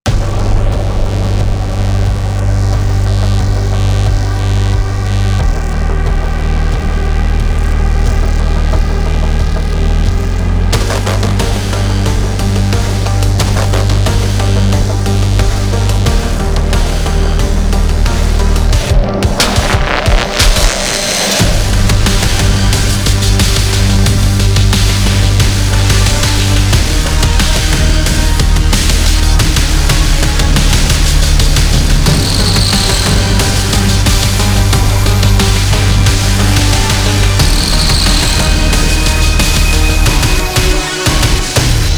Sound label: music level music; rogue stormers